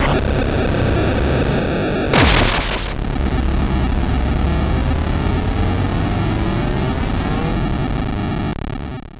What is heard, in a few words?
gate opening